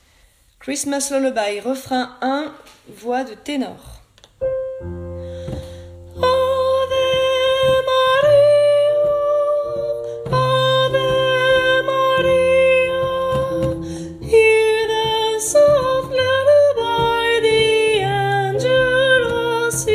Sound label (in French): Christmas Ténors Refrain 1; pupitre tenors; enregistrements de travail